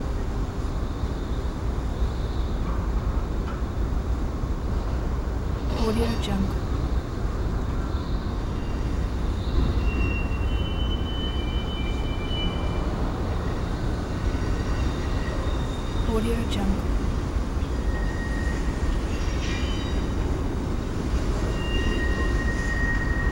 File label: train ambiance